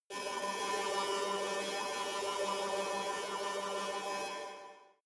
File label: space alien fabric machine buzzing